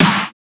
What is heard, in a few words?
Snare; FLPRO; Snares